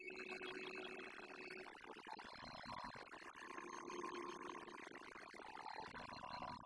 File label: Castanets Violin Applause